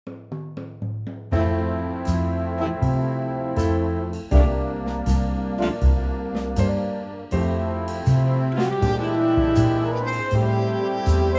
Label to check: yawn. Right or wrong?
Wrong